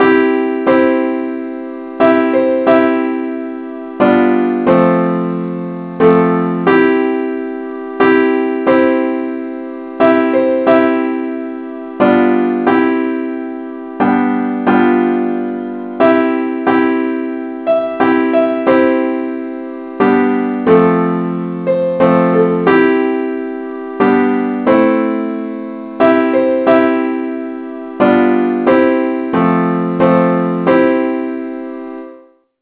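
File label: simple accompaniment key C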